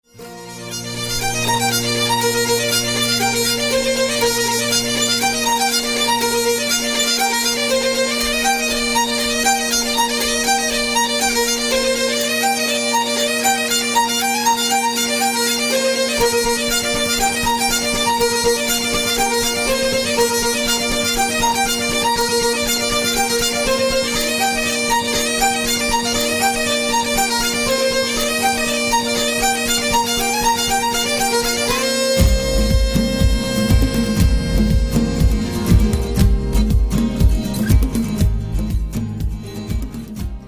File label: irish music